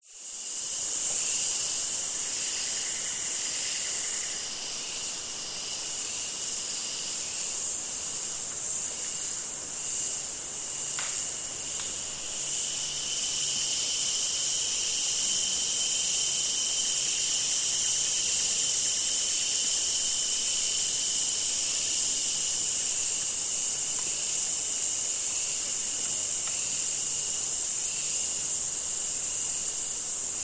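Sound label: nature forest ambience summer insects cicada flies australia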